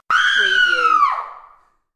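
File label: Woman Scream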